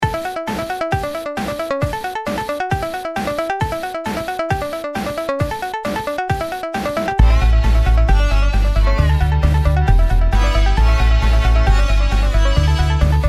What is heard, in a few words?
instrumental